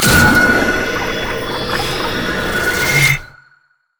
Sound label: undersea lantern; LoL; thresh